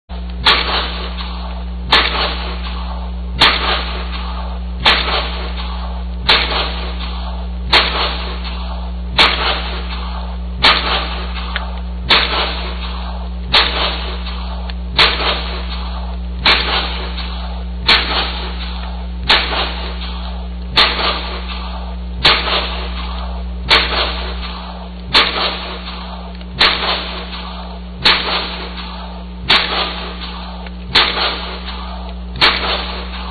pile driving echo

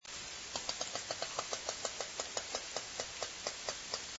j clicks fast slow, orca

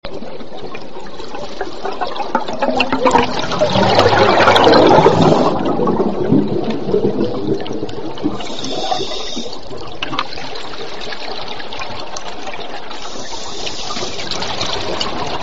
scuba bubbles; anthropogenic; salish sea data examples; signals

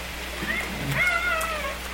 Biggs wiggly call, humpbacks inland WA BC

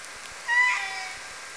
call, Orca; SRKW; Alwin

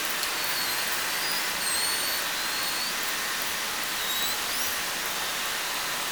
call, Orca; SRKW; Alwin; S10

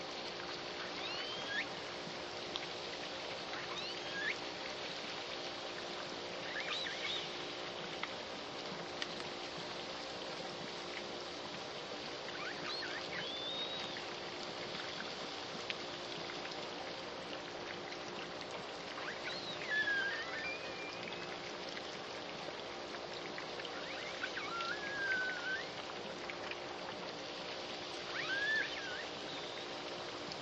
L pod multiple calls midnight after ship noise; SRKW calls and vessel noise

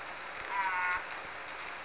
call S4 (J also), OrcaCalls, SRKW; Alwin

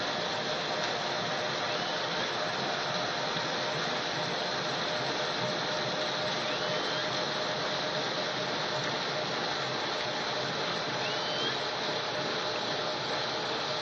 L pod 3 calls midnight in ship noise; SRKW; calls and vessel noise; biophony

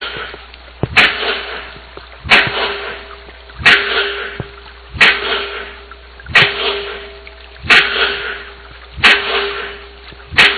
pile driving; neah bay sheet; anthrophony

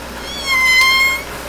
call, Orca; SRKW; Alwin

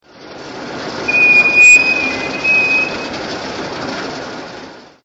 ferry squeak, Salish Sea sounds

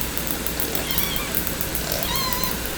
call, clicks, Orca; SRKW; Alwin

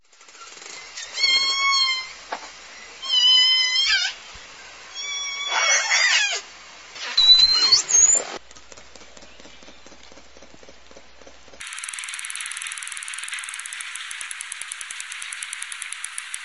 calls whistle clicks, SRKW

